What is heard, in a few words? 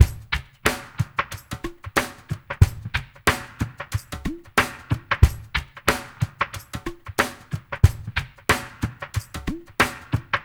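drum mix left; Spectrasonics; Liquid Grooves 1 & 2; CAVERN SW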